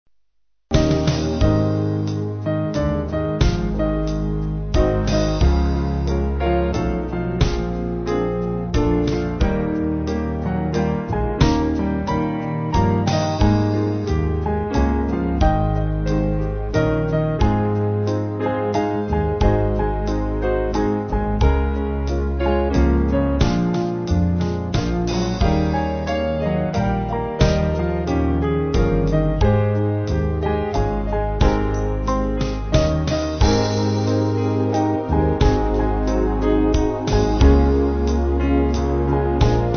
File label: Band